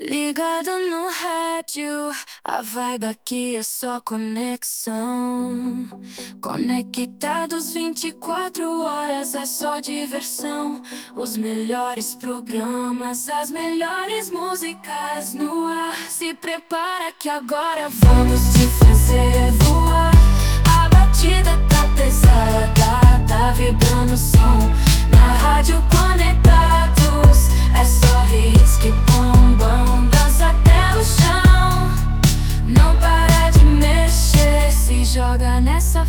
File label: JINGLE RADIO; RADIO CONECTADOS